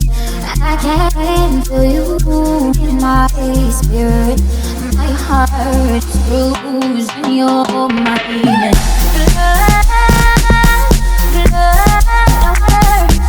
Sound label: Amapiano Remix